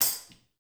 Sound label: TAMB, METALS; Spectrasonics; Liquid Grooves 1 & 2